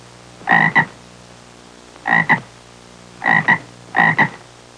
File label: Bullfrog croaking sound effect